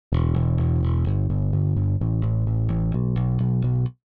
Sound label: bass